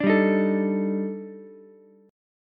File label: D sharp Major Sharp 11th, Ukulele, C6; strummed; 7,7,11,12; JGuitar